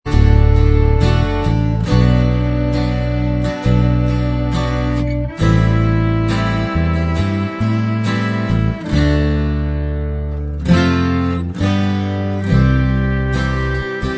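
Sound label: instrumental, track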